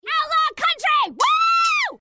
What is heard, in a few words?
Outlaw Country Woo